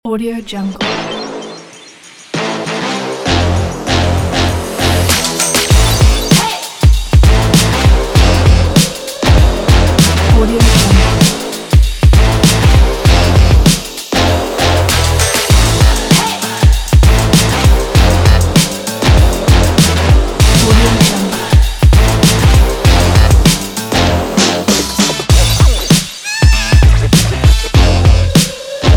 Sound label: hip hop